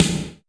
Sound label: snare drum gate; SNAREDRUMS